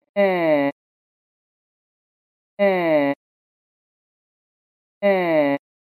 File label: Mummy sound